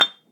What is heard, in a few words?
Brick Drop Concrete